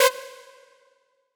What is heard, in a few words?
synth